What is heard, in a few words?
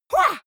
goblin fight shout attack short, comedy cartoon vocals; soundpool